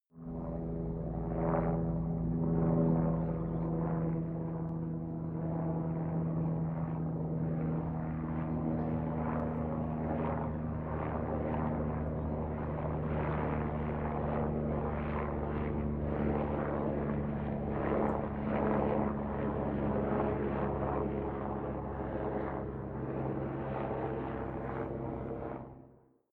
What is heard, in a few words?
helicopter heli pass ambience